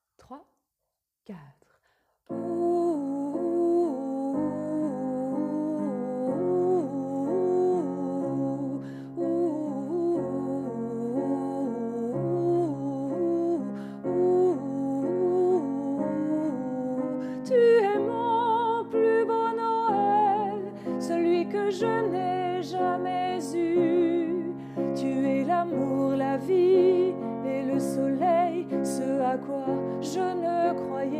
fin alti, chorale

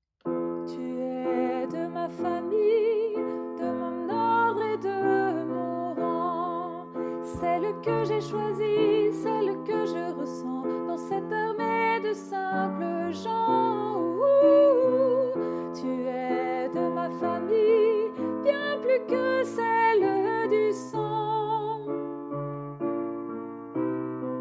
dernier refrain alti

